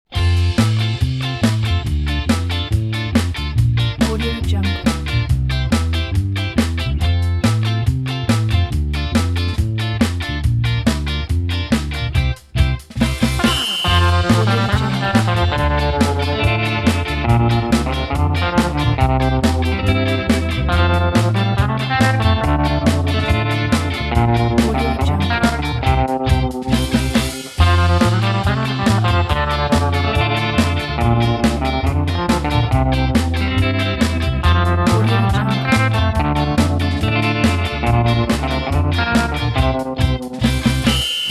happy ska

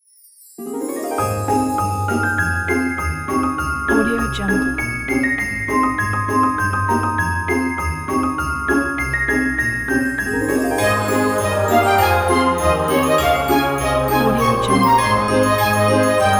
christmas ident

